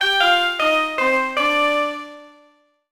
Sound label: Music Gen Small Failure